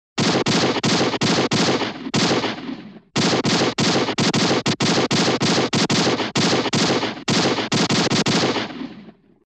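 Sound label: shoots many times, cartoon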